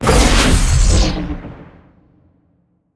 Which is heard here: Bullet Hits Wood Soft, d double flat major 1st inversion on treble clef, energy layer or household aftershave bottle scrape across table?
energy layer